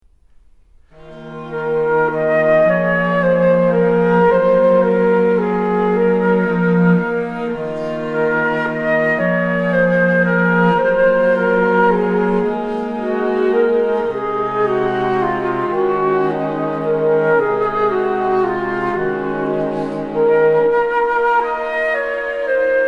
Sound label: Jingle